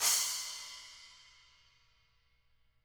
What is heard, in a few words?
SPLK HIT, SPLASH CYMS; SPLASH 10